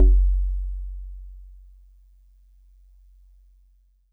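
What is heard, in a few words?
Celeste DX100 F#1; Pristine Celeste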